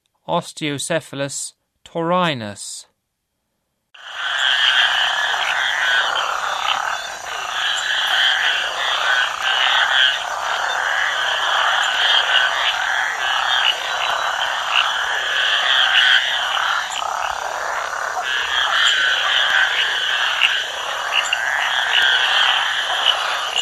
Osteocephalus taurinus, Frogs of Ecuadorian Amazon; S. America